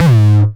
909 Grime G#2, Kicks; Drums; MBase 11 - 909 Grime

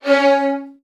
C#1, VN 16TH, Virtuoso Strings; ILIO; Kirk Hunter

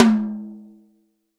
tom, Real Drum Kit, funk kit

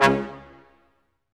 BRS Quintet stac; Roland L-CD702

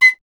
FLT PIC, Piccolo; Miroslav Vitous - Solo Instruments VOL-2

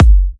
F# kicks; markus hakala kicks

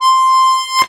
ACCORD C4, Accordions; USB Soundscan vol.40 - Complete Accordions; AKAI

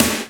GATE SNARE

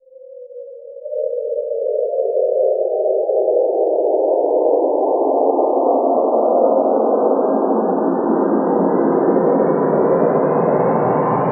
Atmo FX

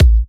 Kicks A; markus hakala

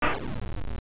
Boooom; Ristar; M8; Genesis

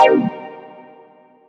Stab C3; 90 sSampleCDs; Club Techno

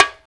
ETHNIC PERC; Roland - LA Composer vol. 1

